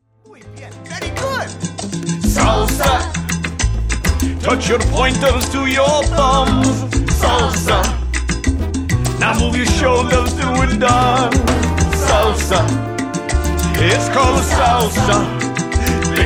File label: spicy salsa